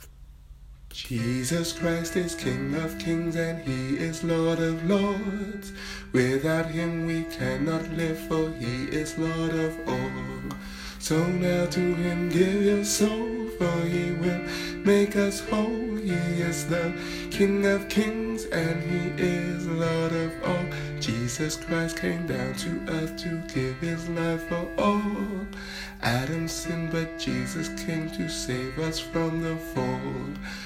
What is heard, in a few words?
Give Him Your Soul voice